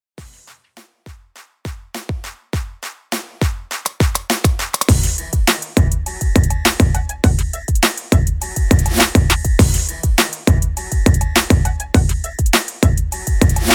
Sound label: Redrum 102 BPM